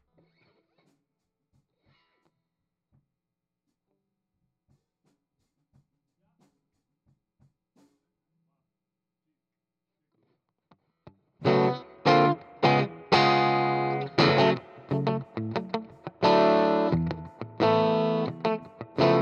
GTR

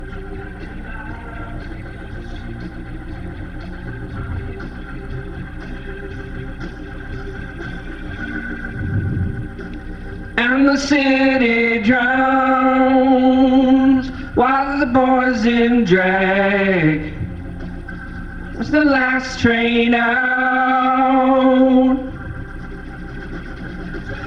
VOX Leslie

